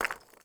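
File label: ui drop charcoal; Rust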